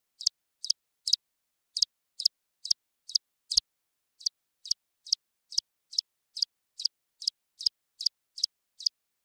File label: it made a soft slow, ticking